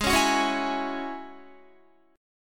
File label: G sharp 7th Suspended 4th Sharp 5th, Guitar; G#7sus4#5 strummed; 15,16,18,18,16,16; JGuitar